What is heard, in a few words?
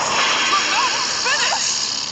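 Dramatic finish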